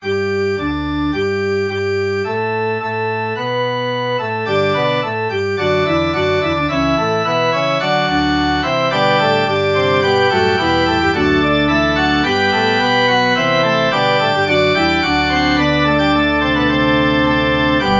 forspil; ddk 153 gld dig zion

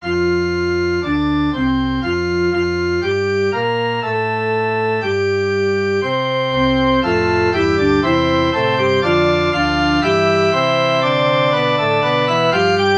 forspil; ddk 13 almindelig er kristi kirke

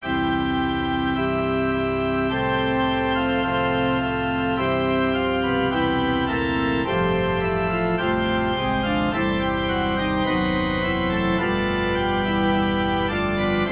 orgel og trompet

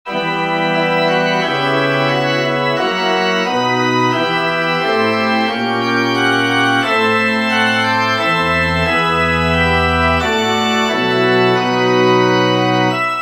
præludium

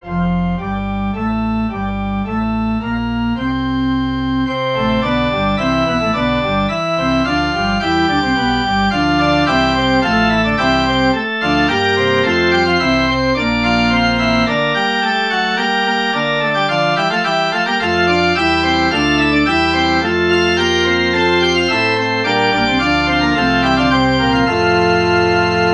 forspil; ddk 209 herrens rst som aldrig brister

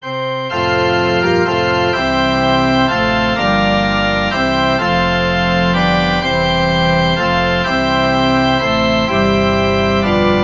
forspil; velkommen igen

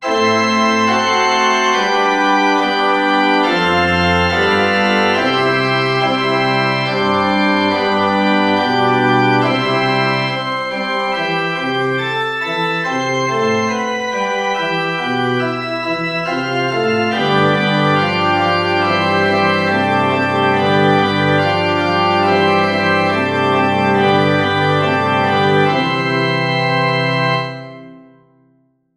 forspil; alle mine kilder

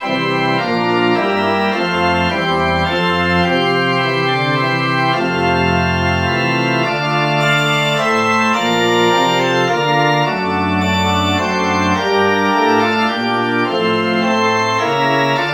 efterspil; ddk 338 lover den herre

